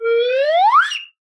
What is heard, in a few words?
slide whistle long crescendo; soundscrate